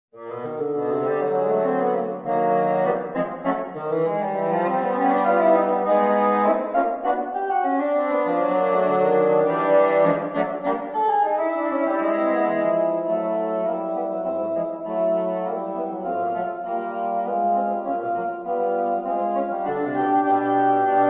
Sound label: Bassoon Trio